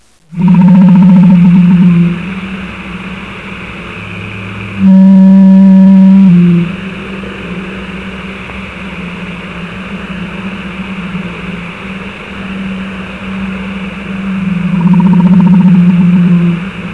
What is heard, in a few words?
Blue Whale, Ocean Noise